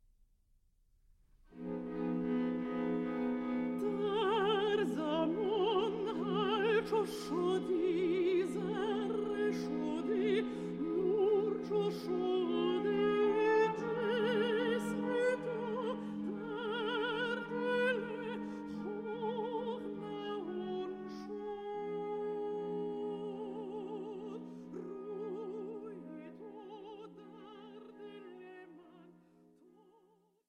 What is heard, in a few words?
folk songs